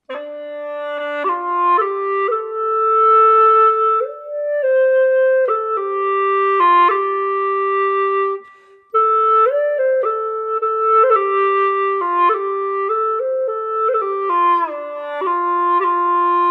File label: hulusi solo 葫芦丝独奏; hulusy